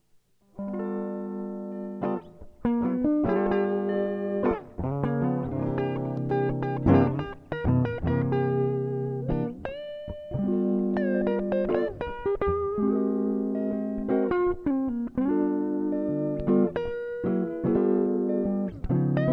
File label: guitar sample, stereo panner; analog synth